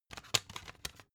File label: cd take out